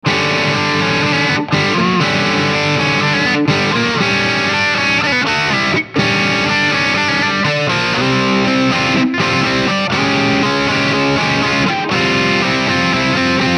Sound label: Rythm, Patches